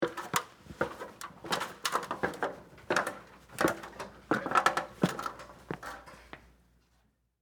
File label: Metal Ladder Down, Industry, Metal, Ladder, Walk, Down, Feet, Perspective